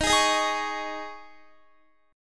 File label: Eb 7th Suspended 4th, Guitar; Eb7sus4; strummed